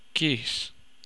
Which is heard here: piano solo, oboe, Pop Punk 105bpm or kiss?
kiss